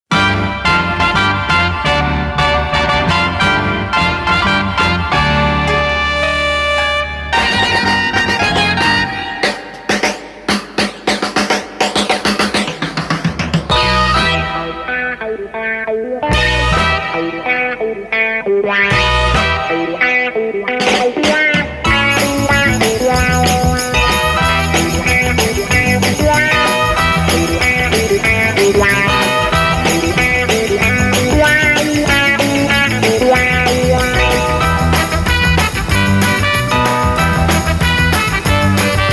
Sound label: Guitar Shower